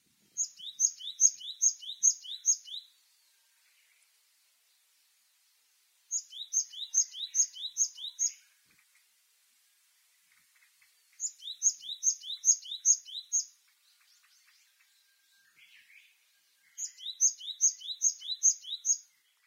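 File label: Okinawa Japanese Tit; kunigami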